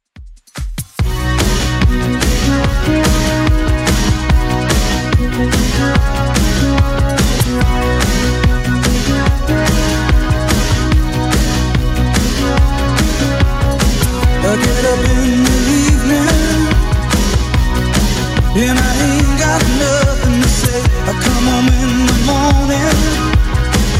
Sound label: 80s Club 145 bpm